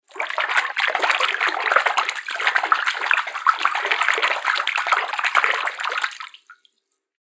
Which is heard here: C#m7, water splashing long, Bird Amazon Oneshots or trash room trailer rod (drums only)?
water splashing long